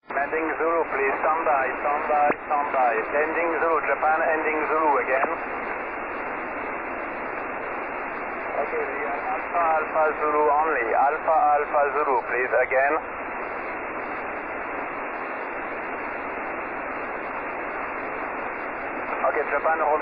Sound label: 21.300MHz SSB